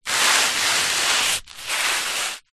footstep single scrape scuff sandal concrete light dirt; foley; zapsplat